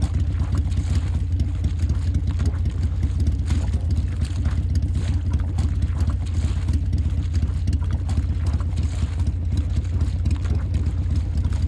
jetski rpm; giants

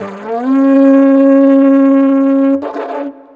jetski; giants

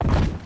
foot, charger; giants

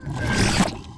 spawn, ripper; giants

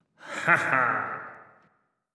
laugh; giants

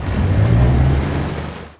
jetski; giants

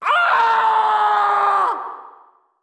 SCREAM; giants

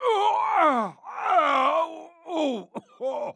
die; reaperman; giants

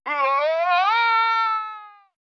SCREAM; giants

